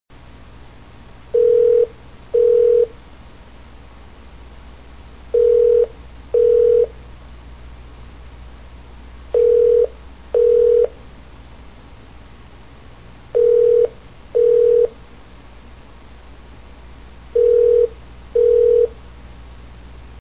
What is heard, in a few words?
ring